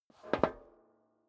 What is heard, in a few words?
step dress boots metal rattle scuff; schritte; Footsteps; menschen